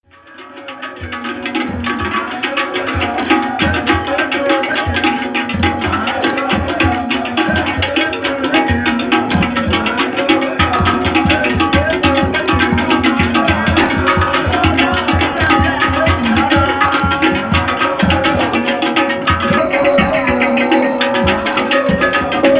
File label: Gong players; ille-ife